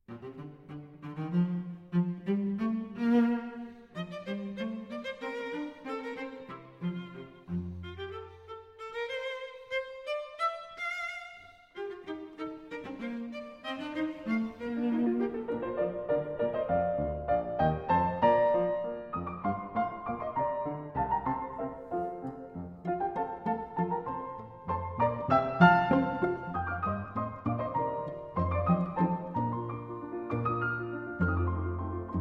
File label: Piano Trio in B-Flat Major; Beethoven; Op. 97